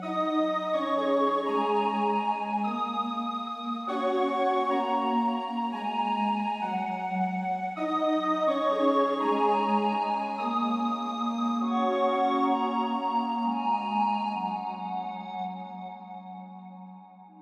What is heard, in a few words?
Float Melody